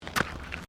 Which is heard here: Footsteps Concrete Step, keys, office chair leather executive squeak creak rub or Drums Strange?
Footsteps Concrete Step